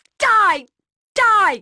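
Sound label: attack; NWNDS; Edwina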